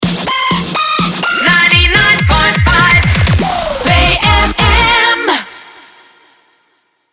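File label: 125bpm F minor Shotgun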